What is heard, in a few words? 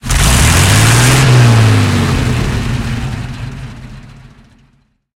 panther engine load, engines tanks; War Thunder